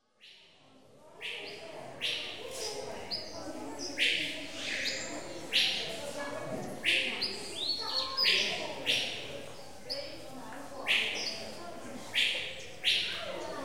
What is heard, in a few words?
Atmospheres - Zoo, interior of bird house with children; Cities; The BBC Sound Effects Library